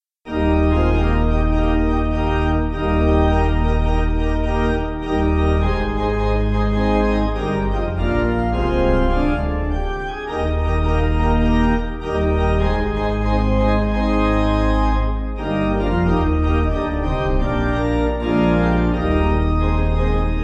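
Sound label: Pipe Organ, Backing Files for Churches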